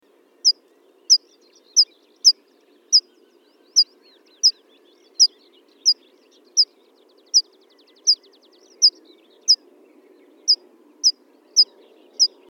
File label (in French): pipit des arbres, chant